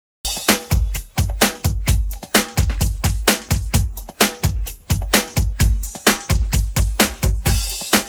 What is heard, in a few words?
pattern, drums